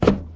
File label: STEPS Wood, Hollow, Walk; High Quality Footsteps